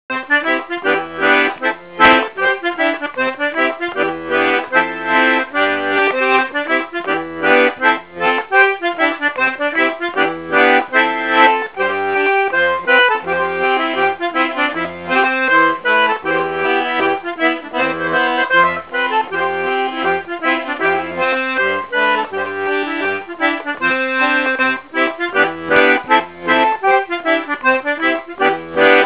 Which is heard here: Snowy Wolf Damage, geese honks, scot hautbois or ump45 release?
scot hautbois